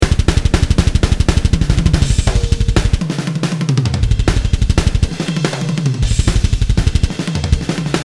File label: CC Trip Groove